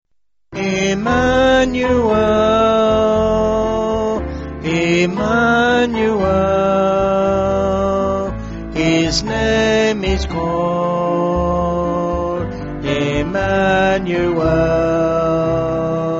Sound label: Vocals